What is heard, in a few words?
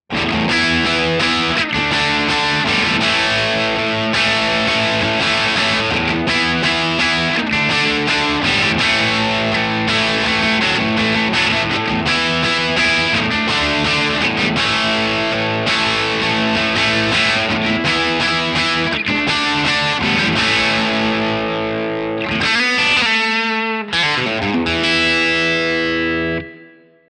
crunch; jensentone